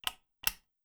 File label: switch; hints and tricks